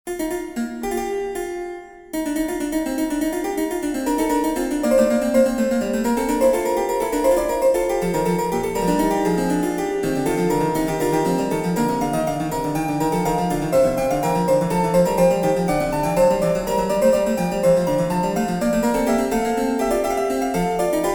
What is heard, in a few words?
German harpsichord fuge; German Harpsichord 1741; Demo 4; JS Bach WTK II; edition organeum